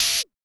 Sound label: Drums; Distored Reality